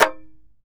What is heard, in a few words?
tar; Roland - LA Composer vol. 3